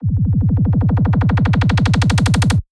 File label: Kick Filter in, fx